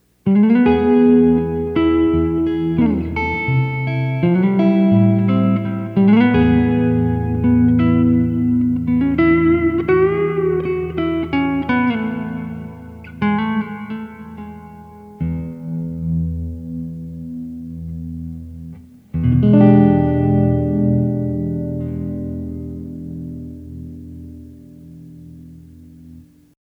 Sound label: plate; rv7